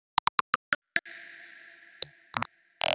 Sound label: Camel Fiddle, Percussion, 130 BPM; Sonic Foundry (Sony Creative Software); Underground UK House & Electro